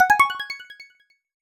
UI Enter; HeavenStudio

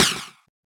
object Out; HeavenStudio; karateman

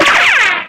Goofy; HeavenStudio; sumo Brothers

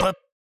roll prepare C; HeavenStudio; board Meeting

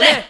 crowd ne jp; HeavenStudio; fan Club

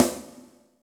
jump; HeavenStudio; night Walk Rvl

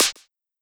left Catch; HeavenStudio; Love Lab

